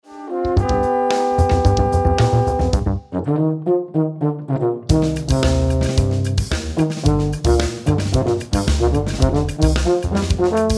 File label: TUBA